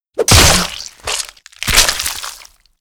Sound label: npc kill